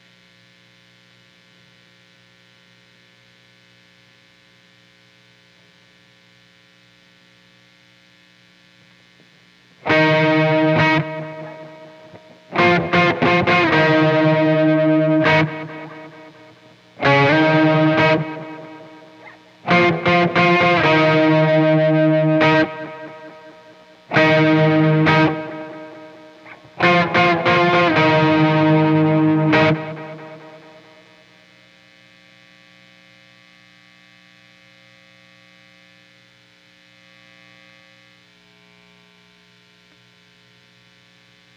guitar

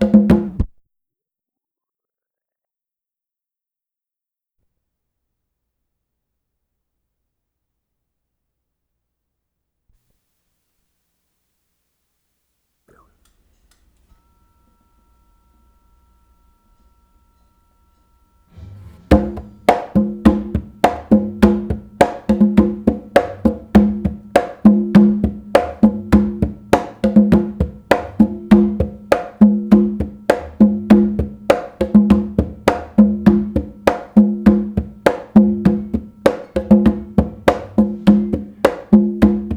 conga

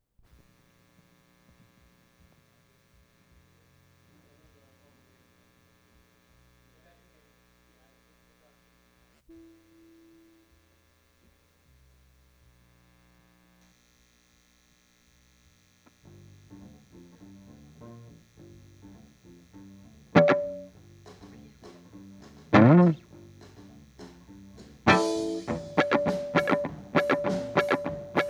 GUITAR

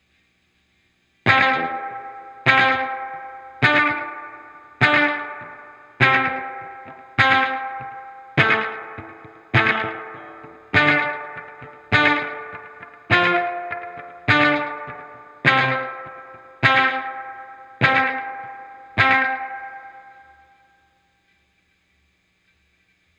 guitar volume swell

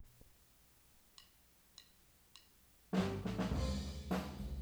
floor

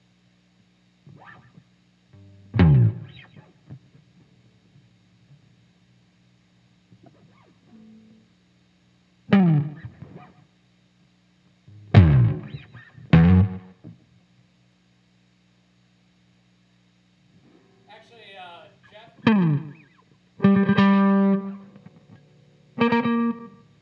bass slide

